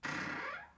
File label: door creak; Five Nights at Freddy's; FNAF 4